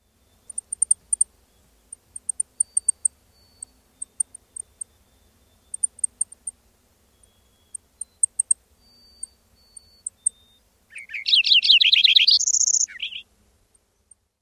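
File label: calls and song, bird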